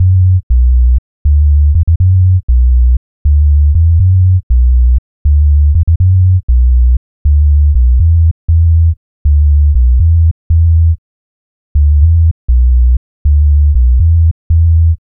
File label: Low Bass; Sonic Foundry (Sony Creative Software); Club Joints and Street Anthems; Drop Top